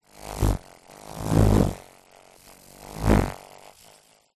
animals bird hummingbird fly close approach away x3